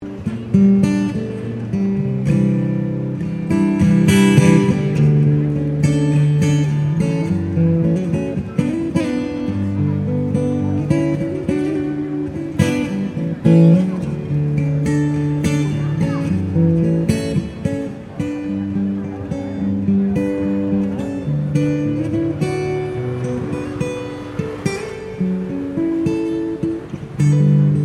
Ambience Busker Manly Guitar, Busker on Manly beach Sydney; Concerts